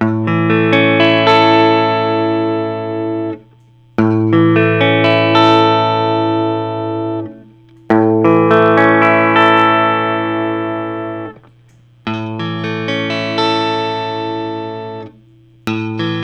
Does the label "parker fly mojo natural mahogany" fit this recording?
Yes